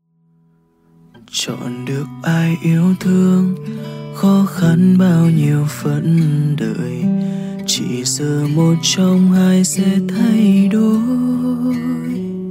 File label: guitar